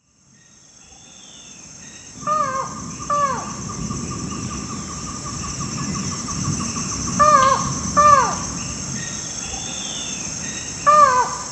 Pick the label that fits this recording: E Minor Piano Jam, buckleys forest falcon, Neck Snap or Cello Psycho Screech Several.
buckleys forest falcon